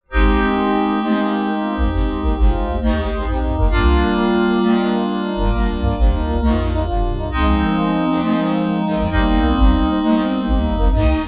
Pop

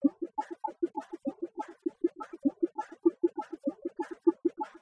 Bongo

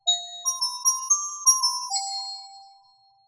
Glockenspiel

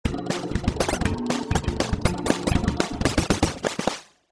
Pop

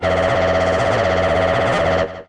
Synth Mono

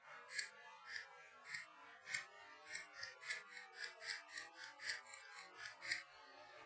Castanets Violin Applause

